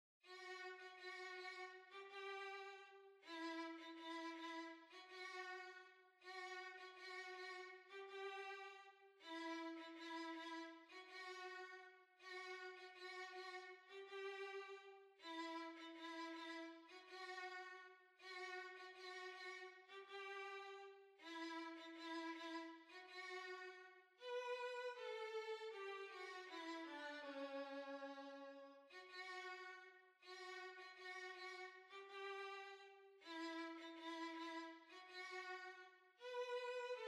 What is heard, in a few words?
Violin